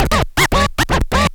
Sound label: scratch; E-MU Formula 4000 Series Vol. 1; Hip Hop Nation; Bonus Perk